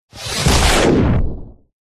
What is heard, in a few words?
sound design hit scratchy lead into a crunch punch; zapsplat